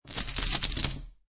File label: Poker Chips Drop Large Stack Of Chips Onto Table; Gambling Sound Effects